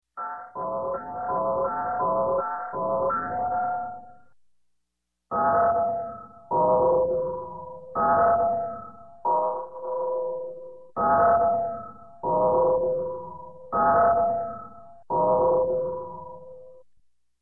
Space Mystery Space Chimes, Electronic; Sound Ideas 2000 Series

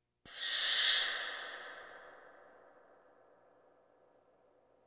Percussion Bongos Tympani rolls Gongs Drums

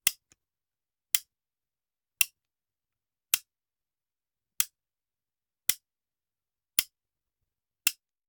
Hand Gun Foley; Sound Ideas; Guns

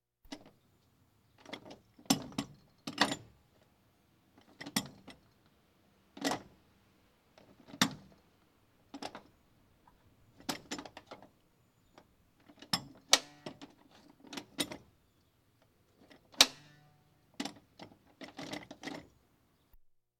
Police, Fire engines; Valentino Production Sound Effects Library CD50

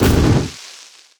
Fire Tank Footstep; AudioWizards

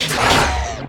Gun Weapon Pickup; SFX Kit; Pickups

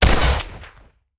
Rifle; Sound Ideas; Guns

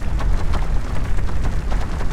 Fireball Fly Loop; Destruction

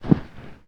IMPACT Generic Soft Muffled; mono; IMPACTS; Universal Sound FX

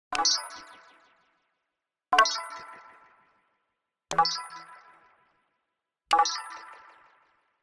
Arcade Click Neutral; boom library; The Interface

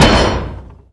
Menu Enter; Menu FX

